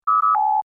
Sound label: computer beep; Business skieme Beta 1